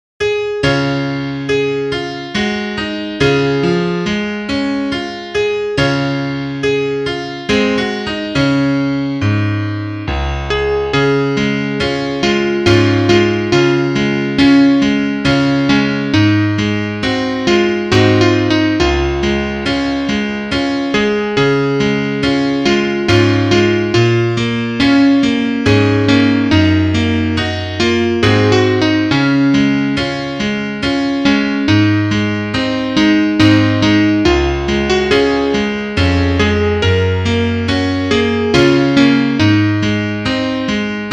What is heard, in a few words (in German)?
Klavier; Liederbuch; am brunnen vor dem tore